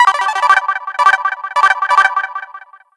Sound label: all achievement; MBHaxe; mbu